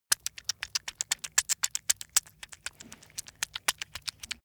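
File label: rag leather fast, household; haus-garten; haushalt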